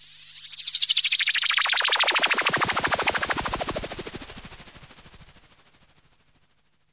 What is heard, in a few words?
FLYBY, SYN. FLYBYS